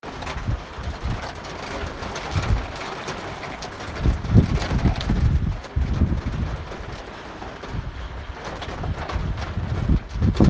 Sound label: winter rain sound; Зимний дождь накрыл Кузбасс; Вечером 8 февраля Белово как и другие города Кузбасса накрыл зимний дождь.